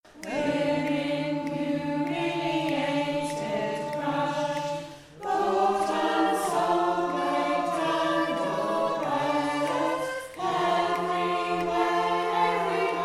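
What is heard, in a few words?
Bass D minor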